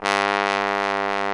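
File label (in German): POSAUNE VIBRATO; Best Service; Alpine Volksmusik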